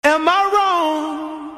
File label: Sing-Song